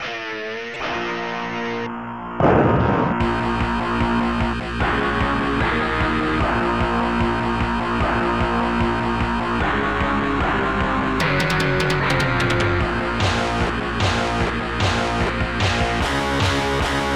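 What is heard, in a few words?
death metal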